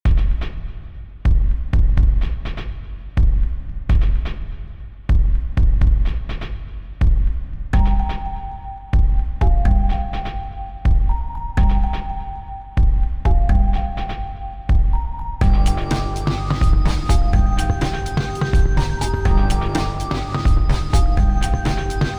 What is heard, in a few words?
Spooky Loop